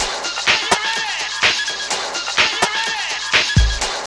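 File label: drum loop; X-Static Goldmine 3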